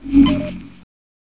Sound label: pow health charge; gearbox